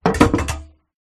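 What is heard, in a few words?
Metal Swing Clank Wood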